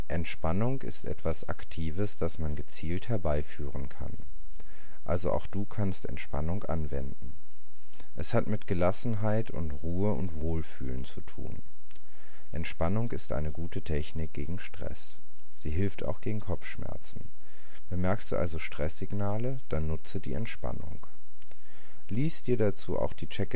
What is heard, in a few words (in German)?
entspannung